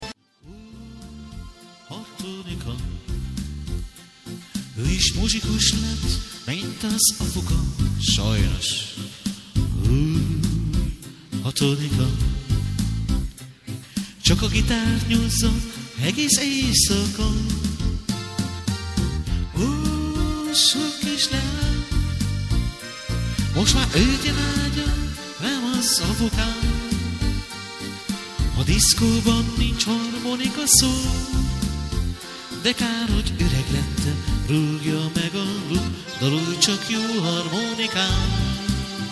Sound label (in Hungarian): harmonikas